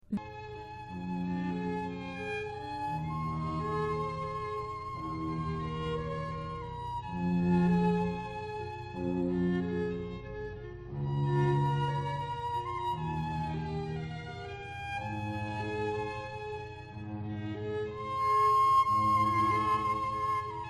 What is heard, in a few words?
string trio ave maria; schubert